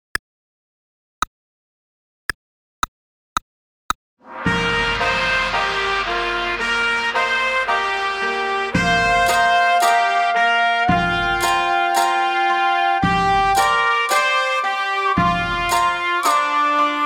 Trumpet Chinesisch Melodie; trumpet fun vol 1

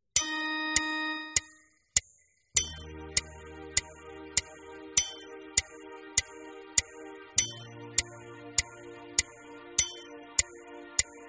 Warm Up Es; Playalongs zu den WARM-UP EXERCISES; otmars warm up exercises

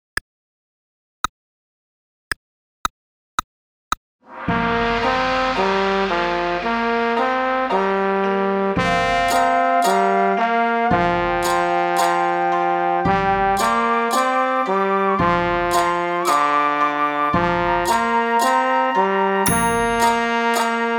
Trombone Chinesisch Melodie; trombone fun vol 1

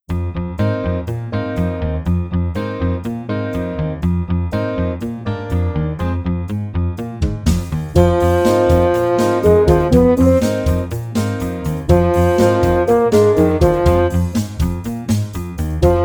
Horn hoch; easy beat vol 1 horn new

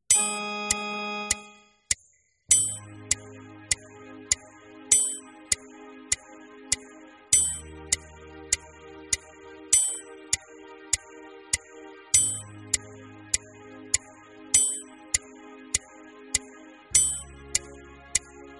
Warm Up As; Playalongs zu den WARM-UP EXERCISES; otmars warm up exercises

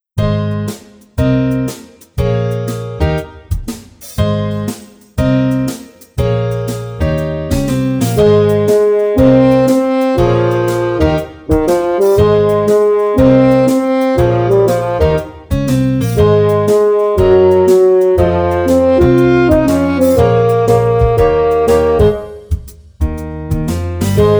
Horn SWING hoch; Melodie-swing; easy beat vol 1 horn new

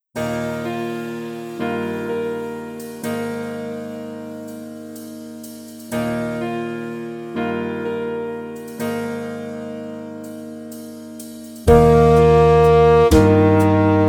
Horn hoch; easy beat vol 1 horn new

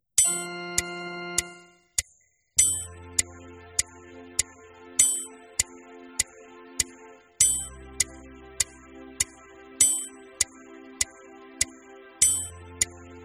Warm Up F; Playalongs zu den WARM-UP EXERCISES; otmars warm up exercises

